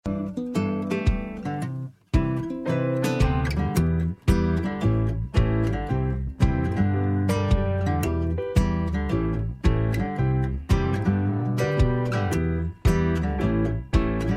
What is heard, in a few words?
Instrumental